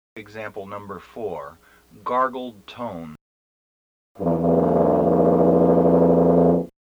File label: Emb TUBA